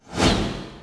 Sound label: whoosh